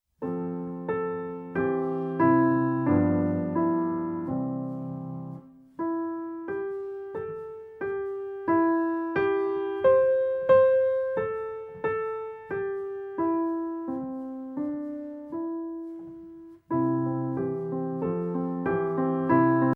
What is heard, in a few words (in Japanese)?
ピアノ